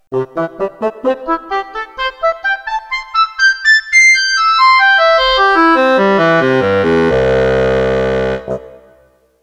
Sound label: Woodwinds Section